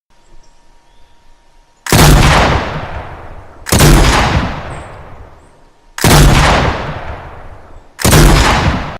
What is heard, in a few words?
heavy shotgun sound effect; fortnite